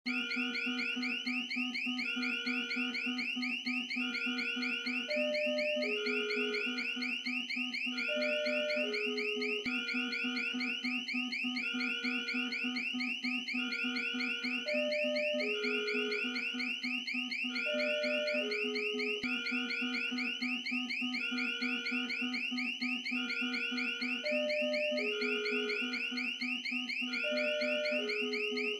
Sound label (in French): alarme coupure generale d electricite; scp